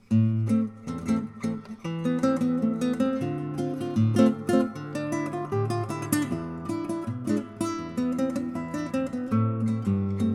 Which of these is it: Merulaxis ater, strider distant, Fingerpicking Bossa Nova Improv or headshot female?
Fingerpicking Bossa Nova Improv